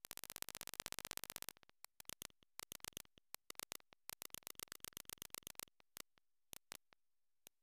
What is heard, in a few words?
Race Start; Tekichuu Keiba Juku 1996; SNES